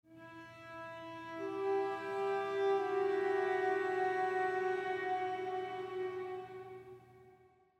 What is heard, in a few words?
Perc Build With Strings Mood; gamerip; the last of us